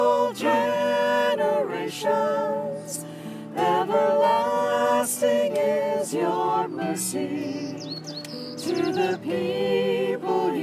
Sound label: bird in the background